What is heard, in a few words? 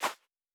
Footstep Sand Running